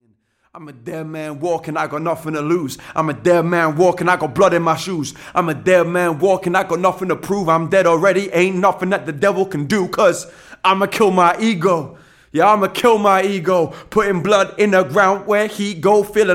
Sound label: dead man acoustic